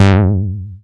Bass Juno G; Vengeance Samples

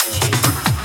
Loops Fills; Vengeance Samples

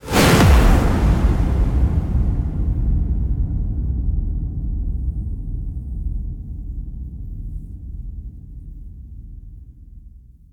bassdrum reverb FX kick; Vengeance Samples

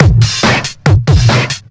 Breakbeat; Vengeance Samples; VEC2 Loops

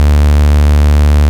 Bass D, Root D

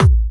Hard Kick; Vengeance Samples

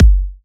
Query Kick; 128BPM; Vengeance Samples; Query Kit

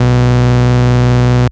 Root B, Ultimate Bass; Vengeance Samples

